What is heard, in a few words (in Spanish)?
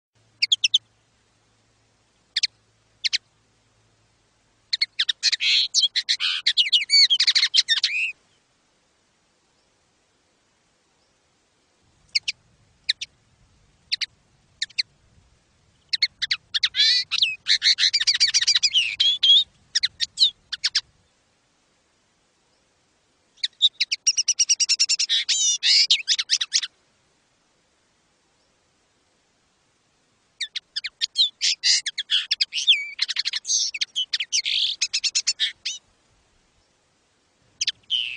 Canto del Pardillo, Chant linotte